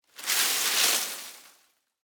Foliage Movement, Texture, Foliage, Movement, Palm, Frond; Rustling; Objects